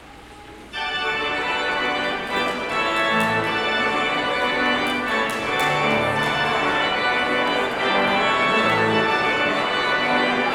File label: Postlude